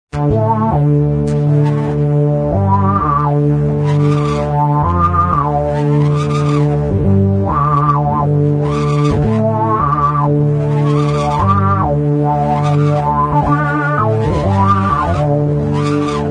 bass fuzz and sweap

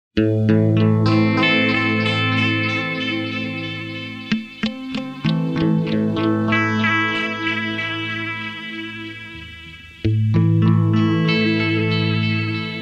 blues echos

